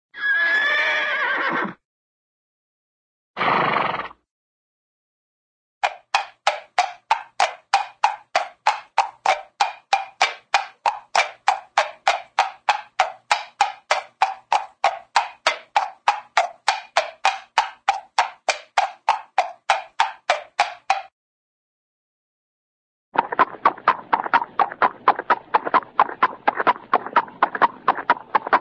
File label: horse single whinny, animal; cartoon